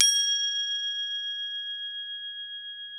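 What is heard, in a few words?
CROTALE G5 R, Crotale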